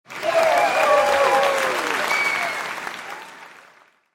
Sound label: cheering crowd